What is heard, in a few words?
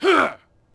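Warrior Attack Vox; Civ4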